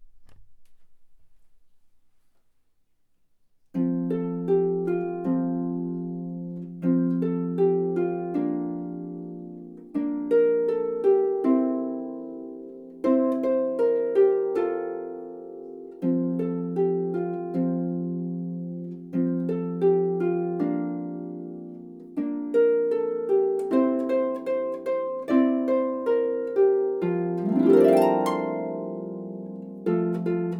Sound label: Jingle Bells; Sonja Inglefield's arrangement of James Pierpont's "Jingle Bells" is for solo lever or pedal harp